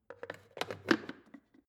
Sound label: plastic water boiler lid on soft; maschinen; wasserkocher; soundpool